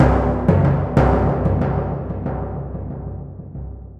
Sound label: Timp b f sharp